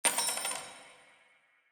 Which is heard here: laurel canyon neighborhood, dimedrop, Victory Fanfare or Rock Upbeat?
dimedrop